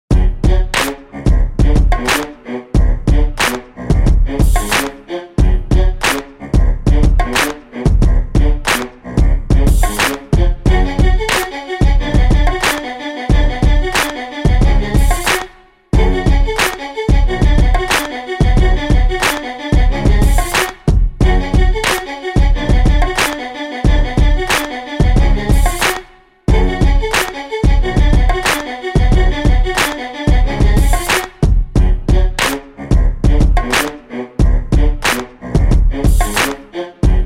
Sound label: marcatto string madness